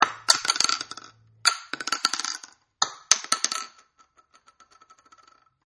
Shotgun Shelldrop Single, Single Shotgun Bullet Shells Drops-3 Takes, Bouncing On The Floor; And On The Ground